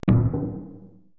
Procussion Mix, EMU E-III; a#6; Retro Sampler

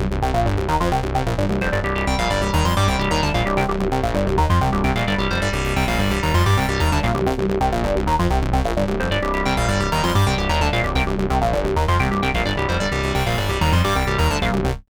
LINE, BASSLINES 130; Masterbits - Tekkno Trax

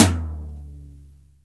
TOM, Drum Dry; East Collexion; Drum 1

